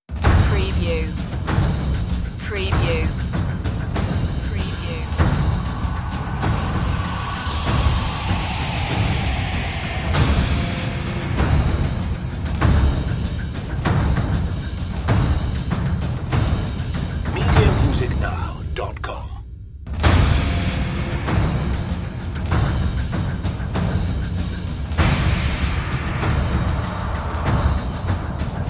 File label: percussion only version